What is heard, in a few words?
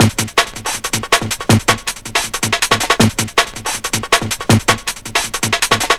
160, Drum Loops; Total Drum&Bass